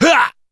pain

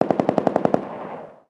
rnd shooting, ambient outdoor

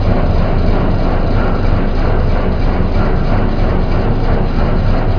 engine run

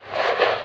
generic holster, weapons

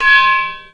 large metal trash, large weapon collide

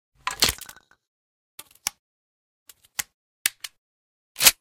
bm16 reload, weapons

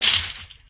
human step

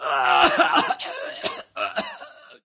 killed; monsters; stalker; death

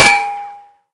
metall, bullet collide

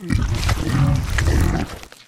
flesh eat, monsters

